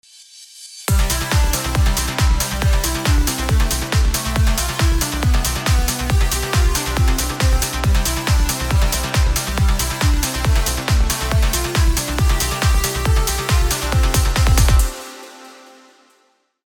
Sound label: Jingle Bells